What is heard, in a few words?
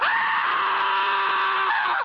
aaahhhhhhhhh; sfx; WILLIAMS; INDIANA JONES; Indiana-Jones-Original